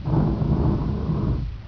rock

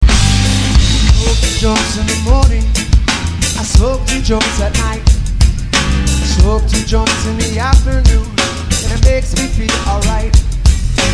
jam

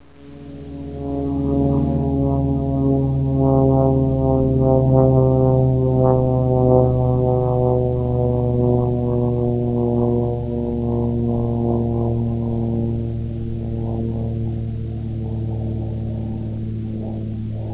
planes bomb

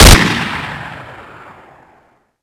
mg42 shoot, weapons; svencoop; ww2projekt

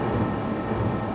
lift

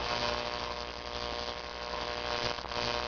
buzzer neon

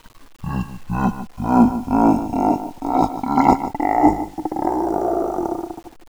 laugh; fy multi

